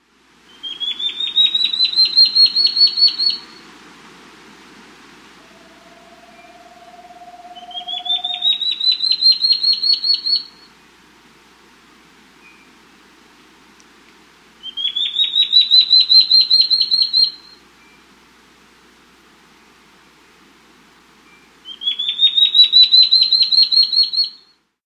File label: Crescent-Faded Antpitta, Nature's Songs, S. America